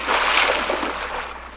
water walk; Mount&Blade Warband

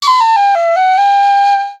quest succeeded; Mount&Blade Warband

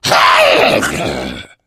jockey death, voice; Left 4 Dead 2

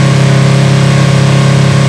4200rpm, engines exhaust WOT; AssaultCube; Alien Stars; American Muscle Series; SkyEngine

